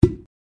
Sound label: pop cork